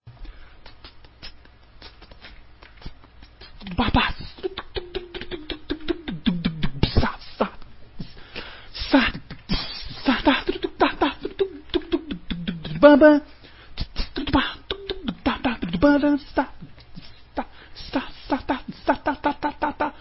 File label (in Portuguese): bateria; Music Soundboard